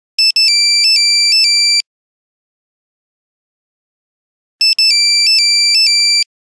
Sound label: Nokia Buzzer City bird; Windows Phone apps